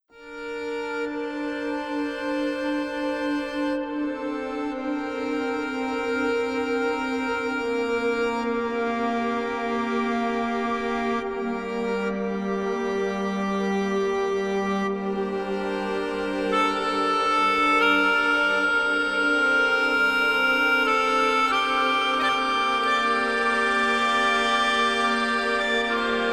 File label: belle ile, melodica